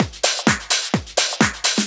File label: Clubhouse Loop 128 BPM